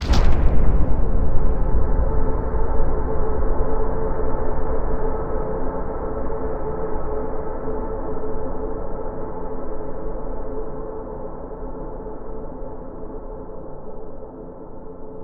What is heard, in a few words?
STING Skeptic Landing, Cinematic Metal Impacts